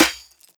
Snare, drums; One Shots; Beaded Up

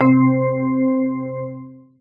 scissors loop; There; ThereClient

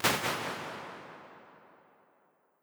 Plates Steel Plate L; Impulse Responses; Ableton Live 12 Lite

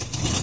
engine transition running; There; ThereClient

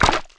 squish; Gish

